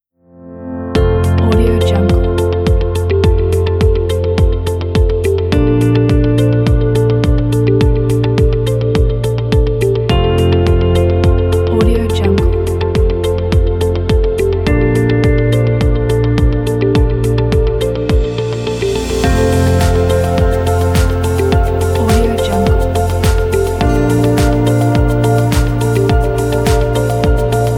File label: air corporate